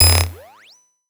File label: Electro Magnetic One Shots; Obscure Frequencies